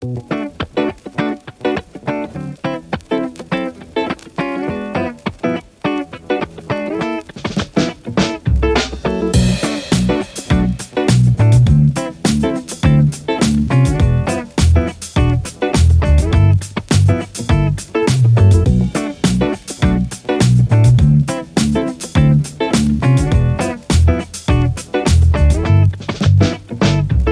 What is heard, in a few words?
Funk